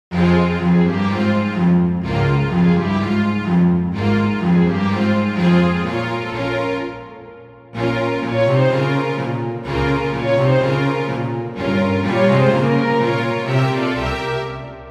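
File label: Bach concerto for clavier F minor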